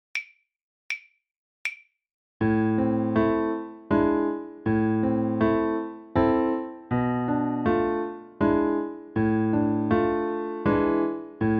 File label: Etude accomp, level2violin; The Violin Fun Book (Level 2); Etude #3